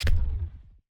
Invisible Track Footstep; TANK; 3Souls

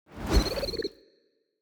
ambience room secret room wisp deploy

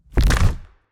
Bone Golem Attack; TANK; 3Souls

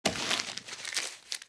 ambience room shadow room working layer

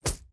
environment object drop moneybag

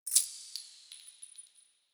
ui debt

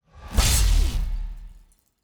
Orbital Sword Death; TANK; 3Souls

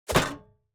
object grab metal

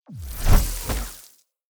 Box Crystal Launch; TANK; 3Souls

